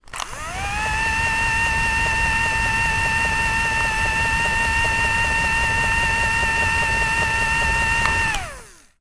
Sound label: industrial motor machine small plastic whirr off